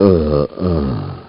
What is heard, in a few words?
DYING; BattleSNDS